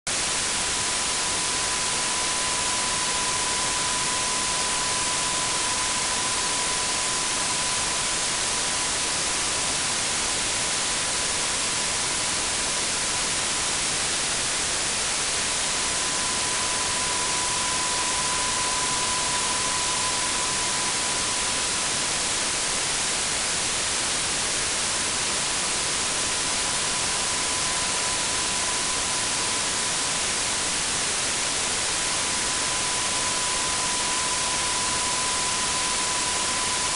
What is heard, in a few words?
ldpc dqpsk31